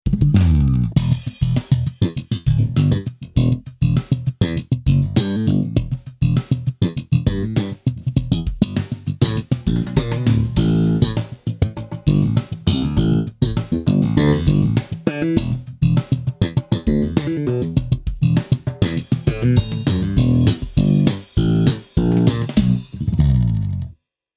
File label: E Bass Slap